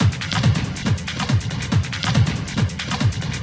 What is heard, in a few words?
Loop 140 BPM; Vengeance Samples